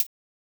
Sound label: Korg hihat; Electro; Micro korg